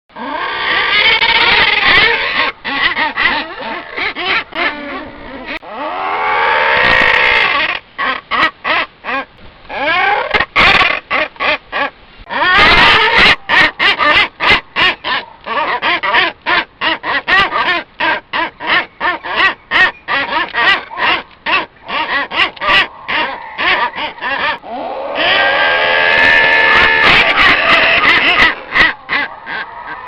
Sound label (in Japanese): umigarasu